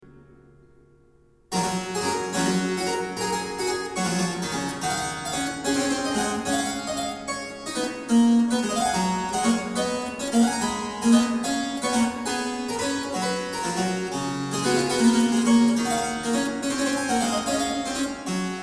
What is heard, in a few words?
Goldberg Variations, Variatio 7. a 1 o vero 2 Clav, Harpsichord; Bach, J S; BWV 988